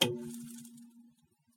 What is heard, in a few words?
switch on